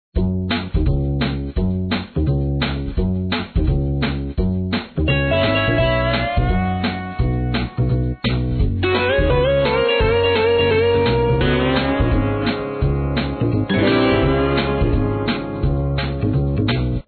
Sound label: Jelly Bubble